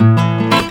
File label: STL STRN BRK; Best Service; World Colours